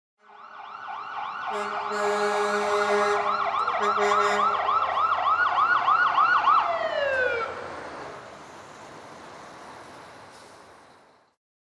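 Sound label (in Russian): пожарная машина с сиреной и горном приближается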